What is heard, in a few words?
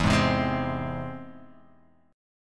E Augmented E; E+ strummed; JGuitar